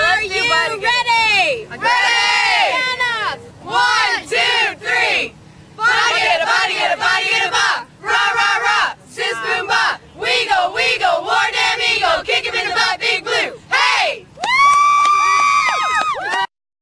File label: girls cheer